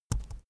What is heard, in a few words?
keyboard laptop enter press slow soft